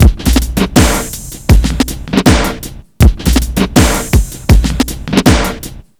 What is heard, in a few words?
Street Tip, R&B Drums